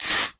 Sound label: tape